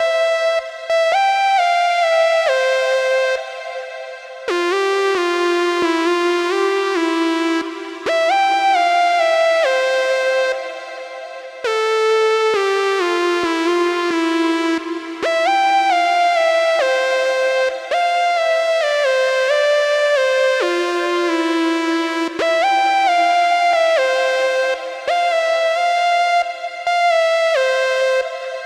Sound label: 134BPM Mainlead WET, C major; Melody & Synth